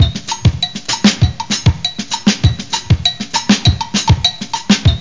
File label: perc loop; OctaMED SoundStudio V1